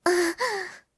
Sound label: moan; Whorblox; ROBLOX GAME ASSETS